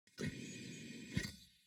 internals; Aurora.3

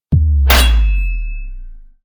judgement fire

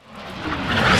mortar long whistle, weapons

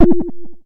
bloop

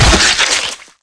tail stab, alien

